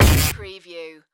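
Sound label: Production Element Hit Distort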